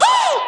"woo"

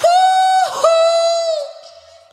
hoo hoo SUNG; ooh ahh; ho hoo woo

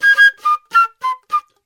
flute merit, Loops, Jazzmatazz

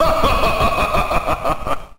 laugh satan

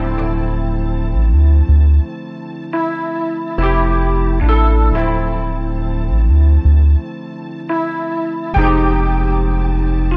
guitar, Loops; Phonky

